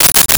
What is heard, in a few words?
Rachet; Tools; 1001 Sound Effects